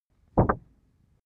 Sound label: cardboard wobble short hard